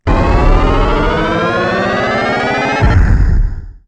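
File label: Arcade Glissando Up Explosion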